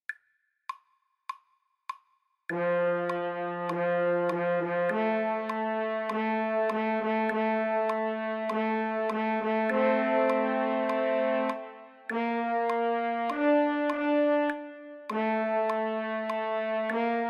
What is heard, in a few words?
french horn fanfare